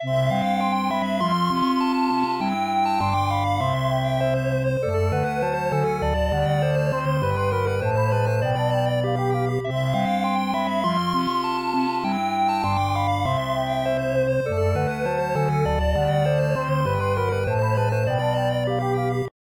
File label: Round Clear